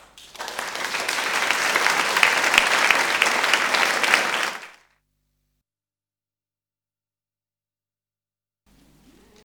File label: Applause